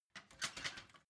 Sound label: ladder metal handling